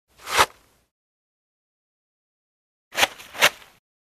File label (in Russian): Щык